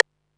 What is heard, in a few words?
perc, Single Drums; percussion